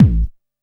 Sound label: bass drum deep, techno trance drums; Ueberschall; Techno Trance Essentials